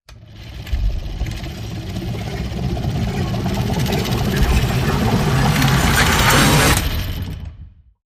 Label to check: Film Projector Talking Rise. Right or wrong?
Right